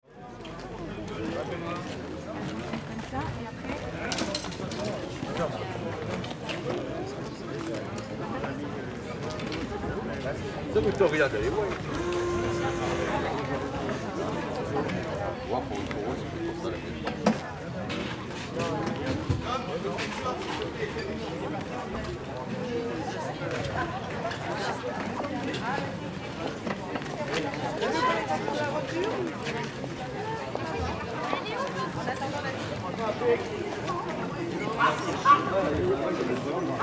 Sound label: Eastern market crowd peaceful